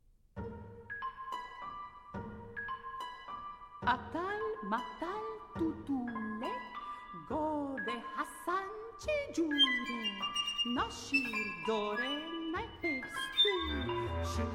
folk songs

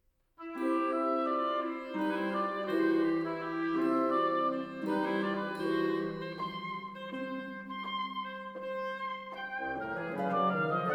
partita in c, pastorale, orchestra

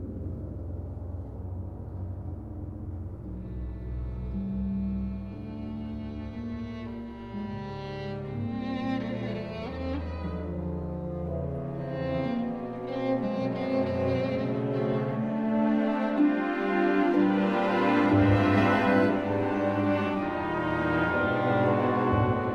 concerto for violin and orchestra

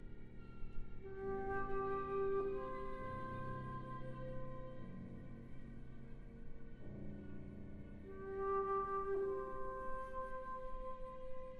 trouble for violin and chamber orchestra, prelude

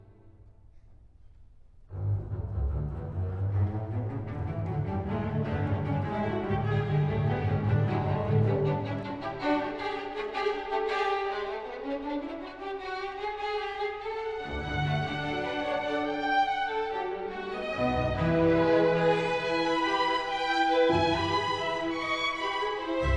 serenade in d, adagio allegretto, orchestra

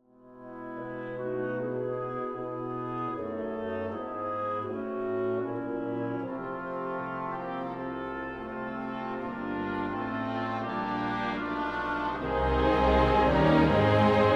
trombone con

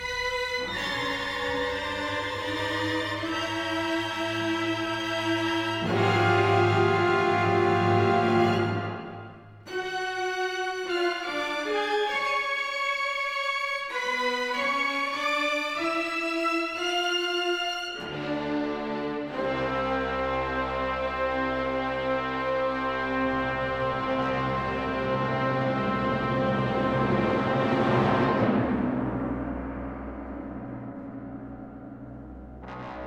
presto barbaro, orchestra

